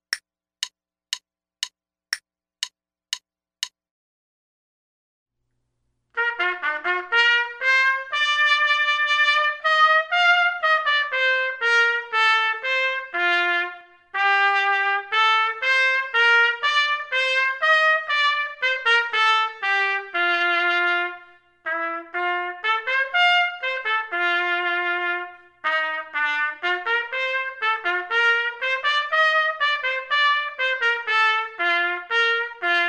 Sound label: trumpet 2, Allegro non troppo; metronome setting for this performance is quarter note = 126; Selected Duets for Trumpet; Trumpet 2 Only